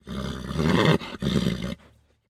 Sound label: horse pair vehicle turnoff